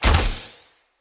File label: Bow Ranged Shot; Weapons; Grand Father Bow